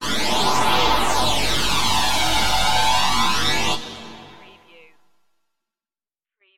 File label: laser strobe pulse